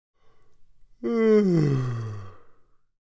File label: Deep big male yawn